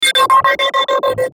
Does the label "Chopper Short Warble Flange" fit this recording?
Yes